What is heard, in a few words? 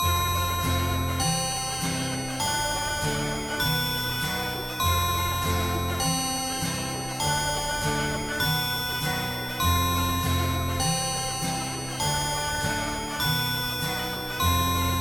Guitar Ringtone